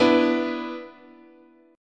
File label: B Minor Double Flat 5th, chord, guitar